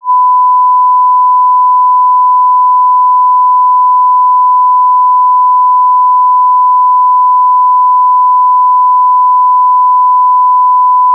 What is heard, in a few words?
sinus